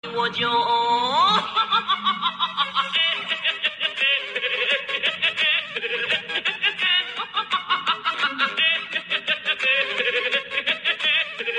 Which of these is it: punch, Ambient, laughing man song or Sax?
laughing man song